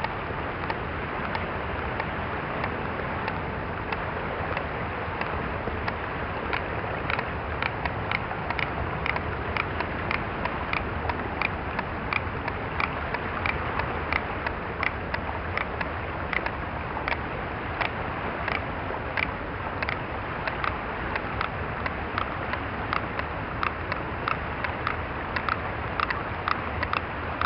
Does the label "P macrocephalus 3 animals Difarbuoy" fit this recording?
Yes